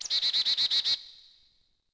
Forest Bird; Ambient; Civ4 warlords

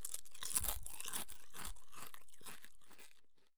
flakes eat; Escape from Tarkov

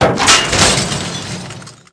metal lamppost creak; World of Tanks Blitz

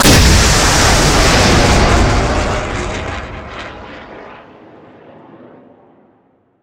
Weapons Missile Launch; DCS

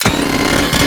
idle start high npc

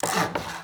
hammer saw; Rust

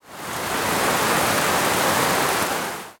ambient battle; World Of Warships

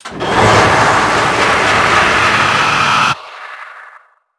perk curse; World of Tanks Blitz; GUI halloween 2019

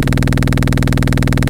loop m61 bass; guns; tanks; War Thunder

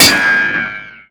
rico metal, ricochet; Modern Warfare

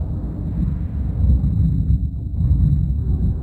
vibration cockpit layer

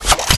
kiting arm reaction

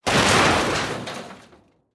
plane; World of Tanks

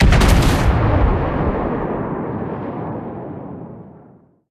ambient port; World Of Warships; port3d

